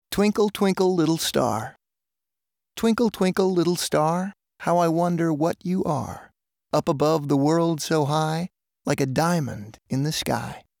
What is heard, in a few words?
Twinkle Twinkle Little Star; Jane Taylor